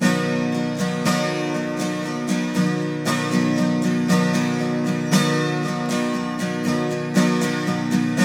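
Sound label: 12 string major C, Guitars; Spectrasonics; Hans Zimmer Guitars VOL-1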